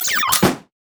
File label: turret; LoL; heimerdinger